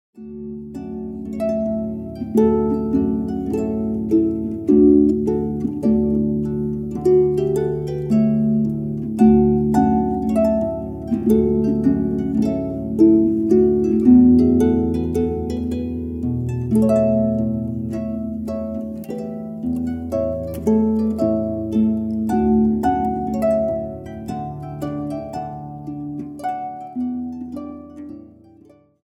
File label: Trumpet Tune